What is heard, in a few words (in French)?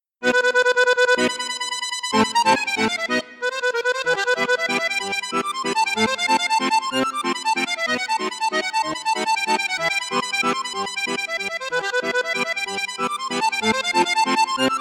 registre flute juste flute haute, accordéon; cavagnolo professionnel 10